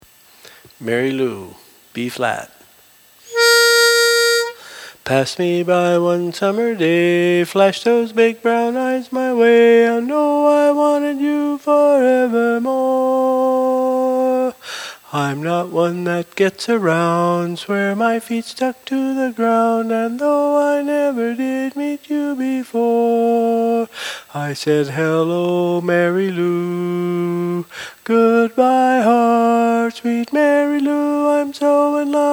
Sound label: Lead